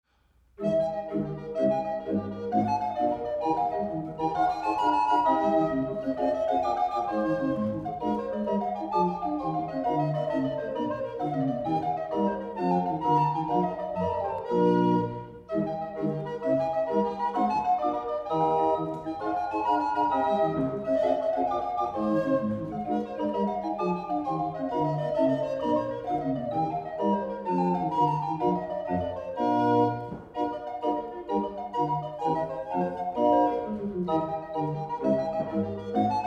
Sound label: Handel Allegro